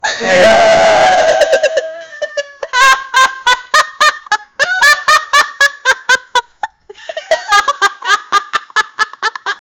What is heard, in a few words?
laughing, amusement